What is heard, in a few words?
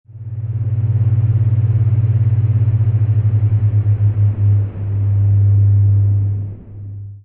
bitone